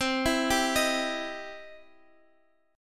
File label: E Minor Major 7th Sharp 5th, C